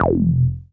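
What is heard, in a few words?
F Acid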